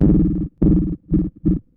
Ring Mod loop; radiosonics

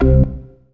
DM ORG1, Dance Mega Synth

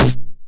909 SD Tape Sat

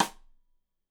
Side Stick Stereo; Deeply Sampled Drums; SMDrum

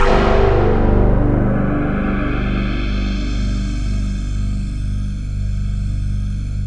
Waldorf MW; Rubber Chicken Software Sample Bank

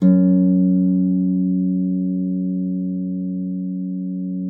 F2, HARP; Xsample VOL-8; HARP P+MF

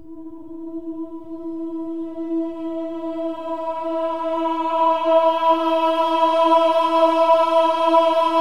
OH-AH E4, choir; Spectrasonics; Symphony of Voices

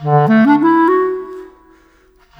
Clarinet; Sonic Foundry (Sony Creative Software); Orchestral Series VOL-4; Rock and Pop; Rock-Pop 05

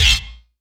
Yamaha CS1x Drums

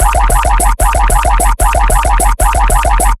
bass loop alarm clock 150 E; Virtual Riot

